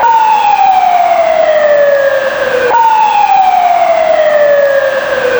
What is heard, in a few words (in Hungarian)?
szirena